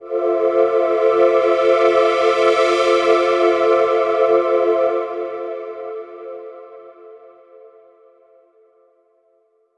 pad halo F

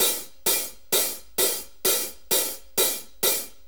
4-4 HiHat Loop; Drum Tools; 4-4 Drum Loops; HiHat & Ride Loops

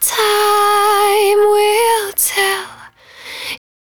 110bpm Ab, Velvet Vocal; Zero-G

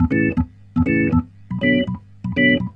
Roots Reggae, B-3; Sounds Good - Roots Reggae

